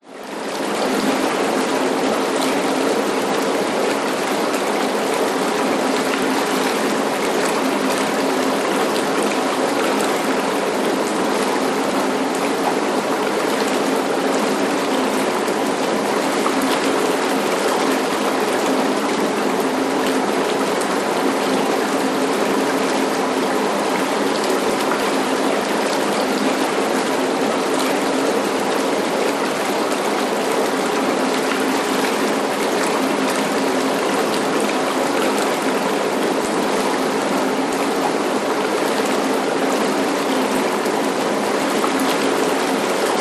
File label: household bath spa run long bubbles close up water; zapsplat